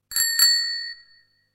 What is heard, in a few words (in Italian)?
Campanello da bicicletta; trasporti